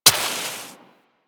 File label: Water Sprayer Mech; Warframe